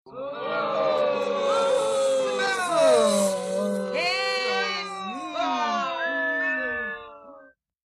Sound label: Boos Small Crowd Booing